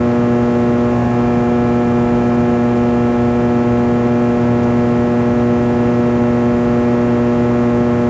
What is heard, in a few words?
Tone; leisure suit larry magna cum laude